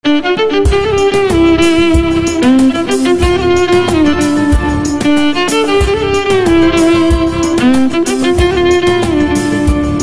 Violin

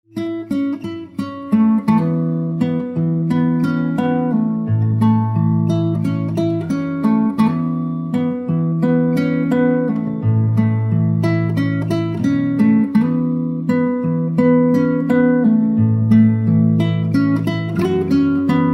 Guitar

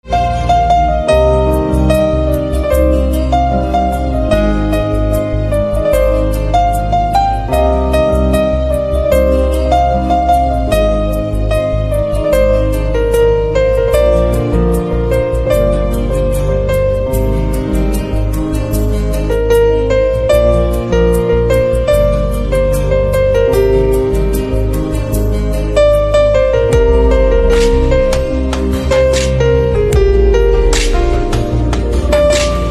Piano

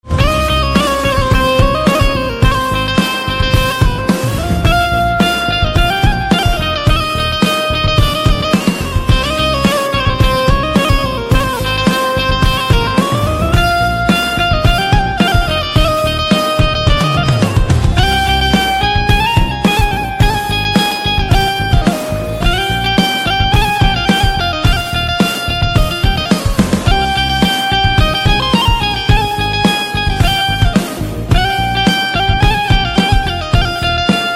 Banjo

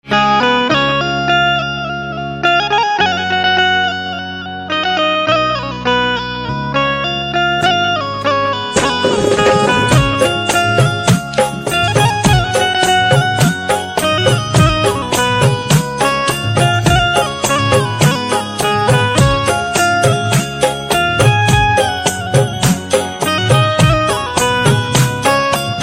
Banjo

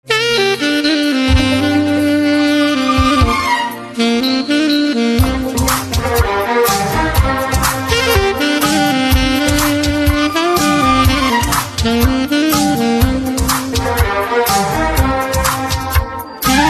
Saxophone

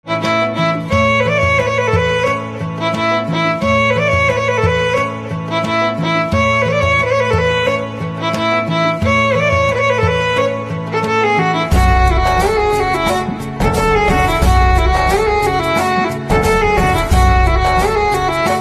Violin

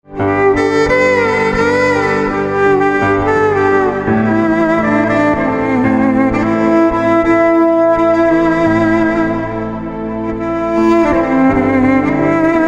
Violin

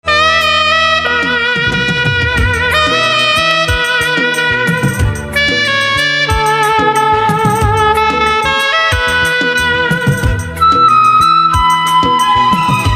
Saxophone, instrumental ringtone

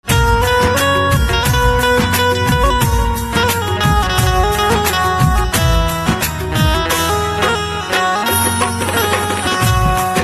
Banjo

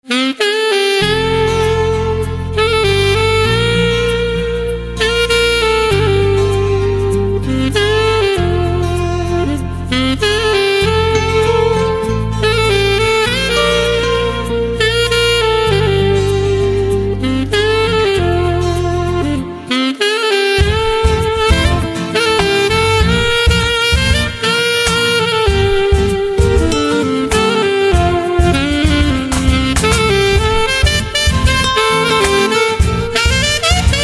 Saxophone

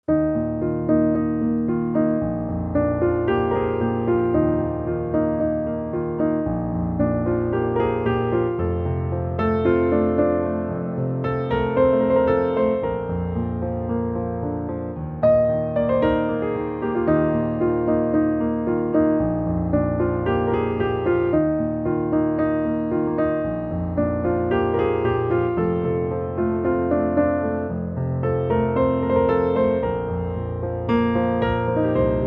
Piano

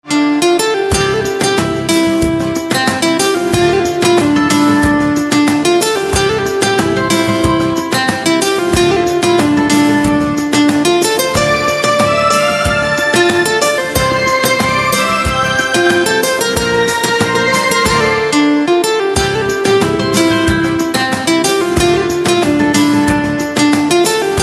Guitar

